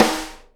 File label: SNARE RIM, Live Snares